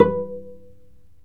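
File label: strings violoncello pizzicato, Chamber; Roland L-CD702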